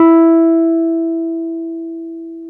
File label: PNO Wurly